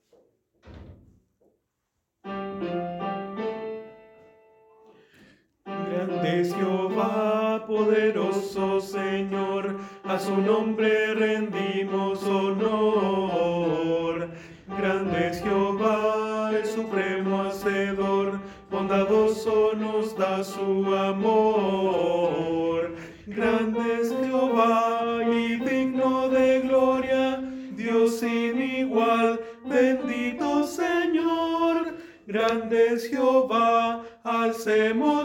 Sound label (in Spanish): Tenor, coro; Grande es Jehova